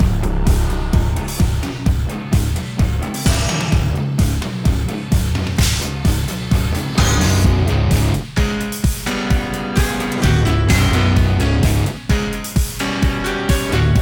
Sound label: Cruise Engine Room Loop; fashion police squad beta